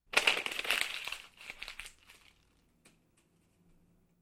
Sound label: Paper Crumpling